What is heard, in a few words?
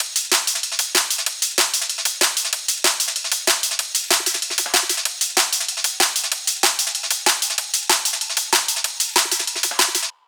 Amen Clappy EQ